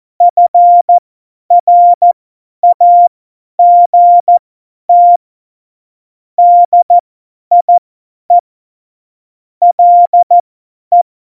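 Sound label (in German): Witz, cw morsen; Morsekurs; Witze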